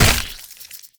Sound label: Destructible Plant Burst; Warframe; Ambience; Grineer